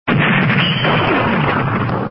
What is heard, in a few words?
bomb; neimeng